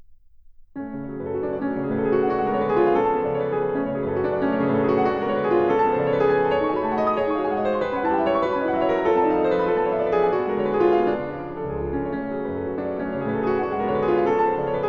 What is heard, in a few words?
Prelude in F sharp minor, Piano; Chopin; Preludes